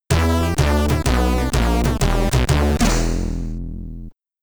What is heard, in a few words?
Game Over (SID Stereo), commodore 64